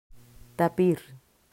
tapir

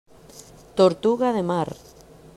tortuga de mar

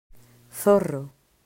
zorro

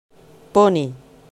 poni

